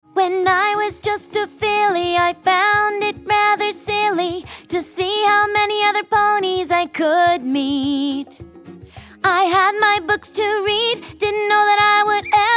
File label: Acapella